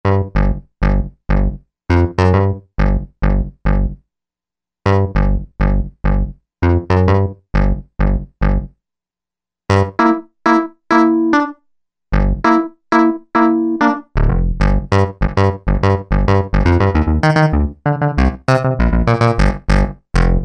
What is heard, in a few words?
Bass hall, Korg MSC-12S Synth; Synth 3